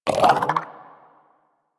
Armadillo Vocal Idle; Characters; Warframe; Hunting Critters

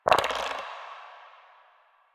Dice Roll One; Warframe; Koumei

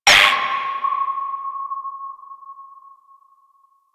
Order Ping; Warframe; Veilbreaker; Devourer Kahl

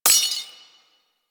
Sword Enemy Frozen; Weapons; Melee; Warframe; Orokin Weave Sword; Weave Boss

